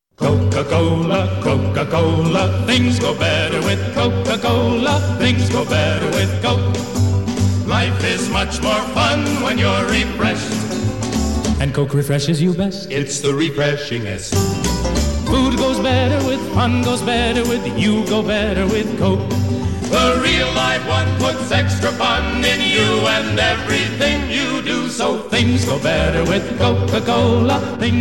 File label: Jingle